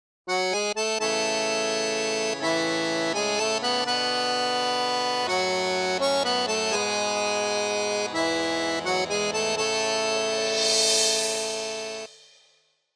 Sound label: Gondolier Theme